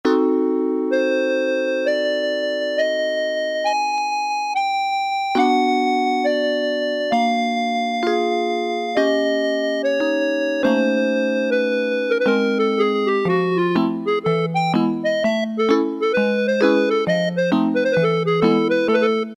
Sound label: Vibe n Clarinet; Roland PN-D50-03